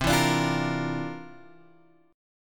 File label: C 11th C; C11 Chord {8 7 X 7 6 6} strummed